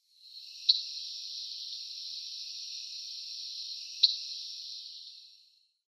Common Yellowthroat calls

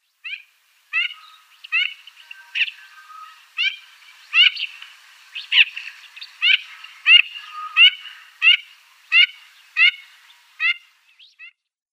Mandarin Duck female

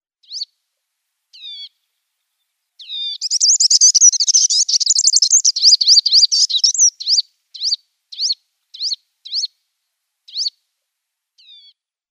Pygmy Sunbird calls

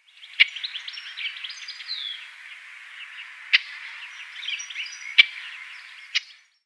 Olive-tree Warbler calls

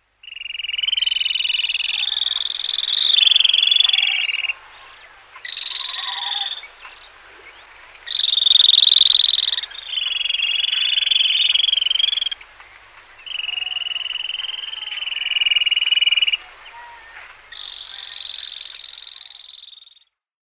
Fulvous Babbler calls

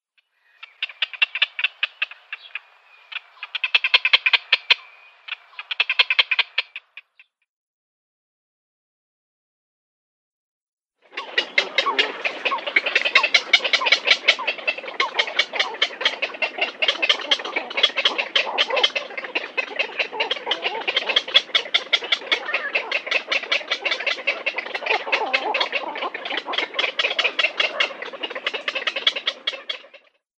Black-crowned Night-Heron nestlings

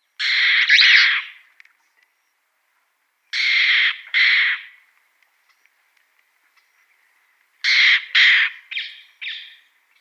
Abyssinian Roller calls

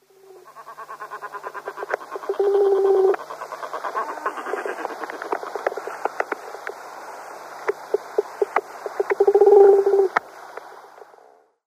Grey-headed Albatross

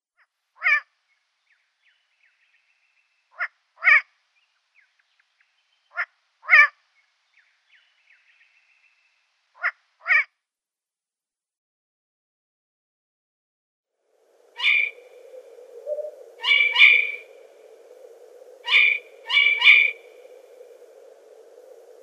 Long-eared Owl calls

